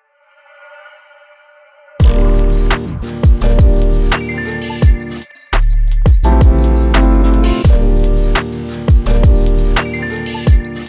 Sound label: vlog lo fi